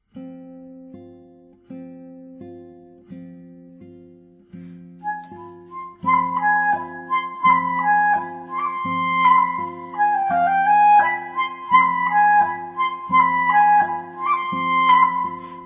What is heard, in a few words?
ROMANIAN FOLK DANCES SUITE; PER CLARINETTO E CHITARRA